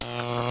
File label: Electric Hazard